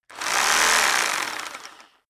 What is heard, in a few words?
rock drill hammer rev long; maschinen; bohrer; soundpool